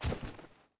grass footstep

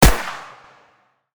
bizon shoot; metro; weapon

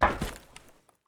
metal footstep

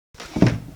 wooden Crate Close

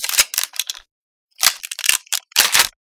svu load; metrocraft; weapon

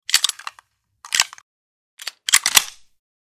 gurza load; metro; weapon

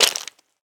stone footstep

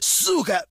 bandit Hit; customnpcs

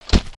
guardian walk

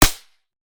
a91 silencer, weapon

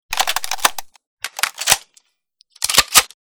bizon load, weapon

